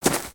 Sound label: Footstep; Lego; Strombie; creatures organic